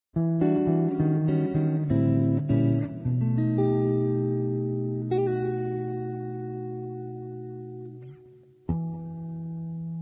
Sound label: Guitar Electric; Instruments